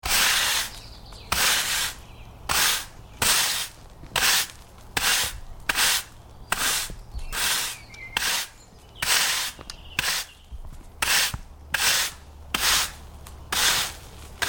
Sweeping with Road Broom, Various sweeping with stiff broom; Yard Tools